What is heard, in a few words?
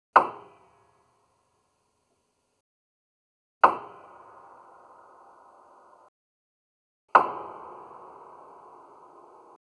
Key, piano pedal